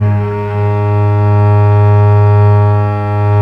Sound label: VL G#1, VIOLE, Historical Instruments; USB Soundscan vol.23